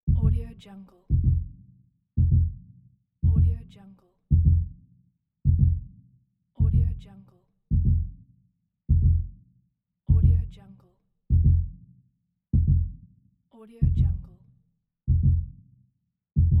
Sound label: heartbeat slowing down